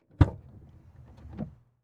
Transportation Chevy Trailblazer Door Open Interior